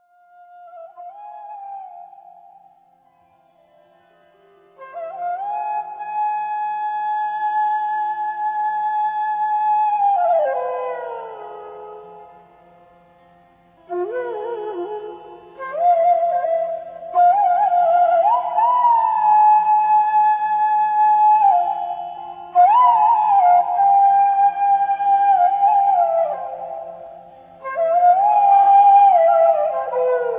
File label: Raga Madhuvant Marwa; Sunset Melodies 4pm 7pm